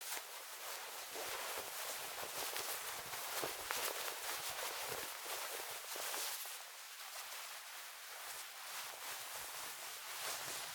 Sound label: dress move; shaman seq 02; Hollow Knight: Silksong